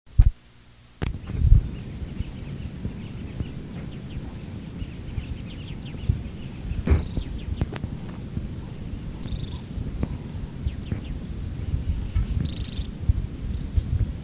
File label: Morning Bird Sounds